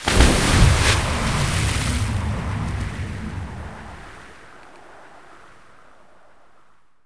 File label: Water Enter; Quantum Redshift